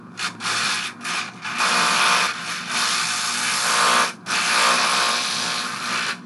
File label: light electrocution sounds